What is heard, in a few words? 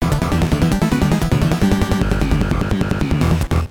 versus mode win; top-gun